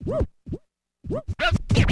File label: scratch, DJ Booth